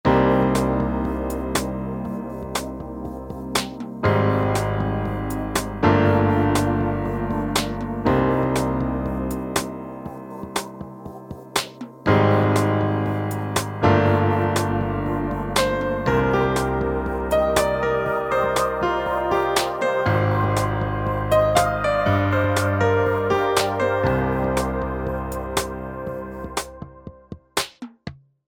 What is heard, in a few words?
Heaven Pad